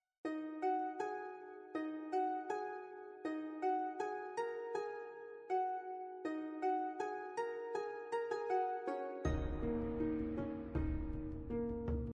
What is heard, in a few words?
level menu bgm